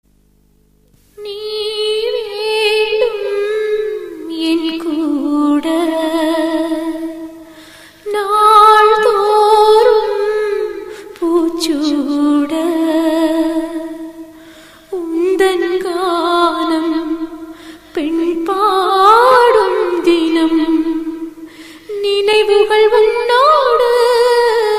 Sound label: (Sad); Nathiyora Geethangal